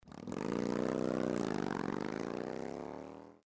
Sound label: harley takeoff fast